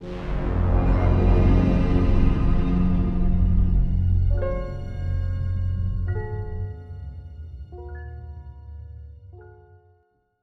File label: music cinematic; midpoint 2